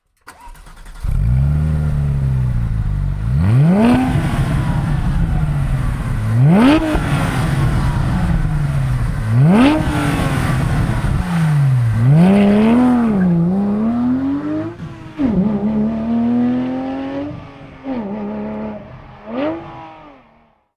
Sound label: 911 GT3 RS accelerate